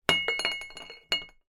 household porcelain ornament hanging door movement swing